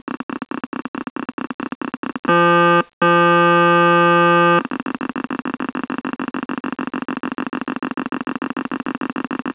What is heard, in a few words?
Diesel